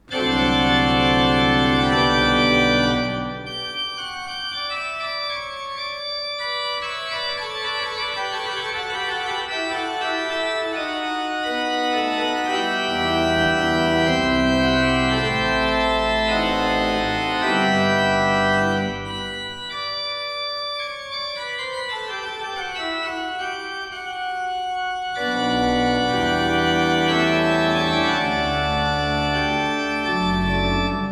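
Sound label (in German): Merkensteiner Orgelbau 19 II P, pfeifenorgeln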